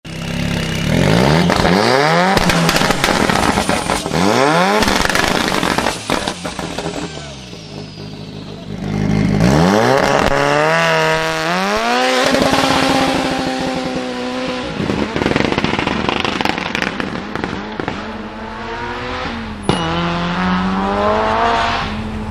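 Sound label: vyhl s vystrelami opel, exhaust with backfires Opel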